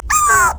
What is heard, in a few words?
sapling charge; maokai